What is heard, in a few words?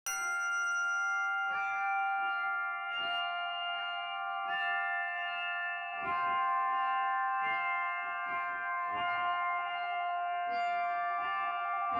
ambient